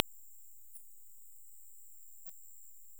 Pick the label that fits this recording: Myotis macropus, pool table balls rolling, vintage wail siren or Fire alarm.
Myotis macropus